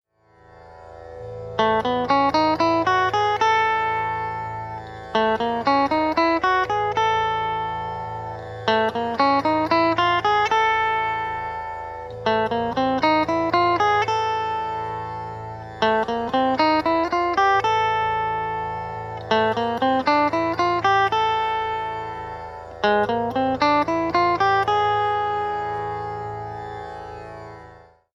THAAT Chal Chain

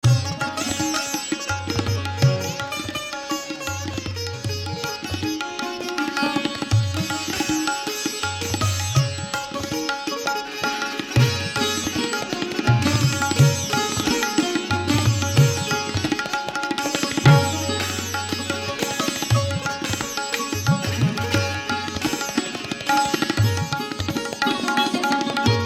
EKTAL Asa Bhairav

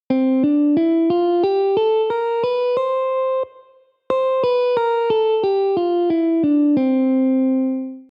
Bebop Dominant; scales

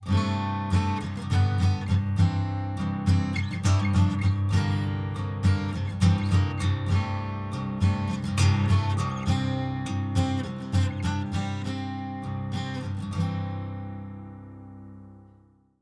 Dulcimer Chords; ragajunglism

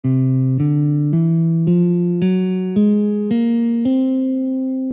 arabian; global scales

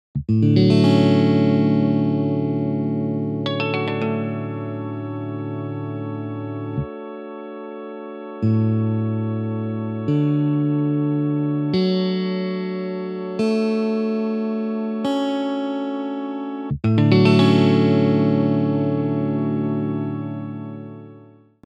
Crowd xADGbAEb; crowdsourced tunings; ragajunglism

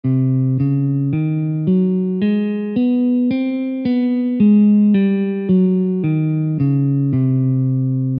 scale; scales of India

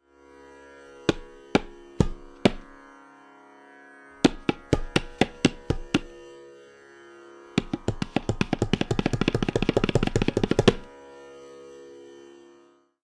TALAS Bol Syllable TRKT

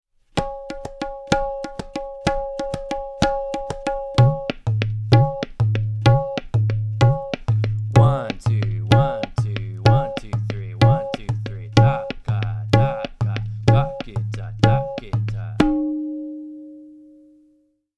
TALA Ewe 32; North Indian tala cycles

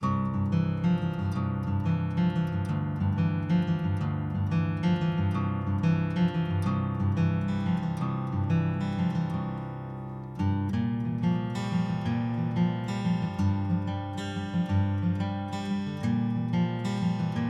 Exercise

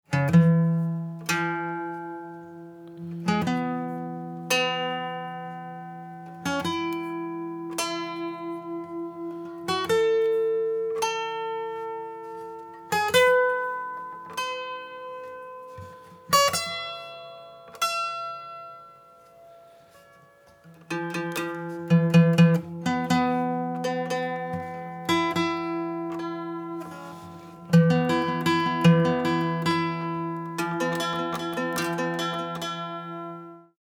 Microtonal Deviations

